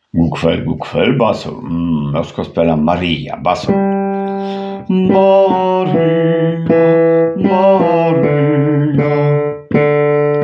bas

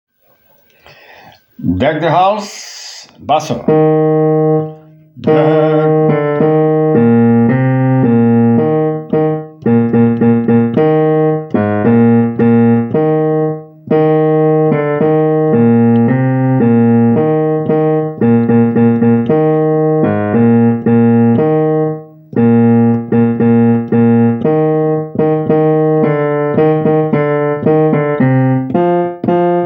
bas

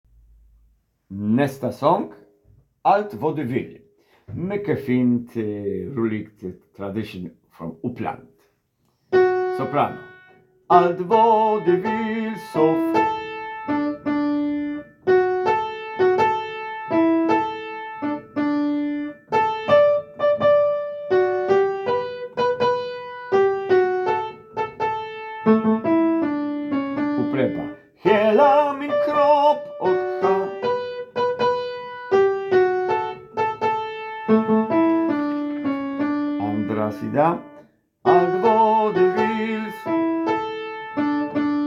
sopran

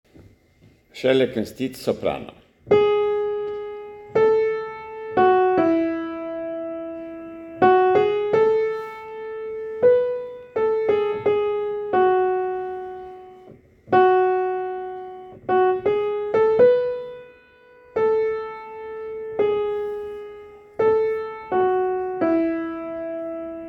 sopran

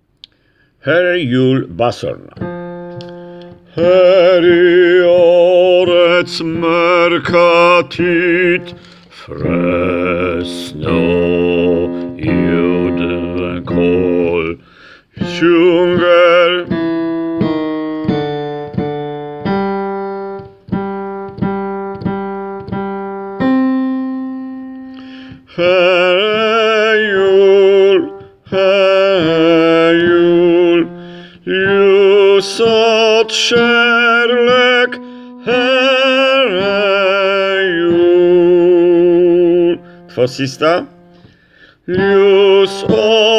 bas

